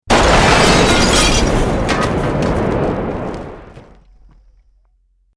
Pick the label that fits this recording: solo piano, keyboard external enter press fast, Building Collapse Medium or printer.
Building Collapse Medium